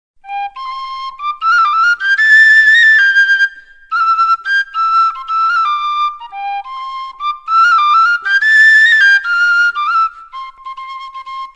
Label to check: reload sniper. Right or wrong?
Wrong